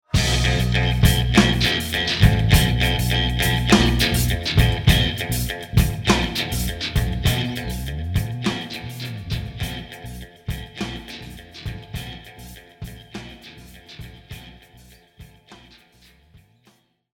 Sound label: Jingle